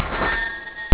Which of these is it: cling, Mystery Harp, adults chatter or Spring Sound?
cling